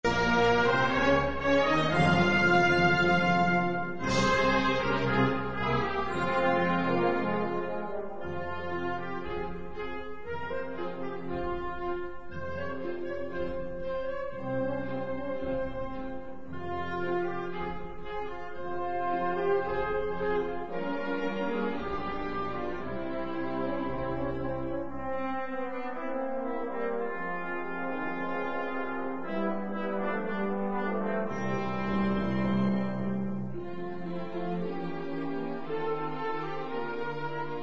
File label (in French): hymne maroc